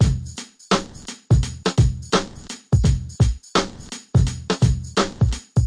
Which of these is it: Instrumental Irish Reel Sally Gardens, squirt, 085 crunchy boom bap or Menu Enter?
085 crunchy boom bap